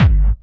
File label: hard bass drumz